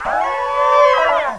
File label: howl